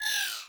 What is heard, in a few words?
Energy Rifle Projectile; Warframe